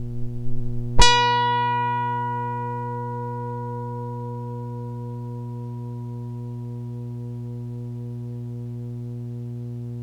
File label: guitar string